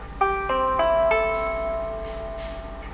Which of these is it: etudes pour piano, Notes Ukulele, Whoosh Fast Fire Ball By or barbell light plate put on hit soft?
Notes Ukulele